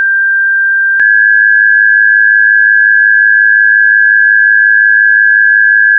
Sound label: g3 + a-flat3